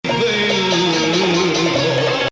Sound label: Bayati Ajam Low